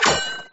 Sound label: power ready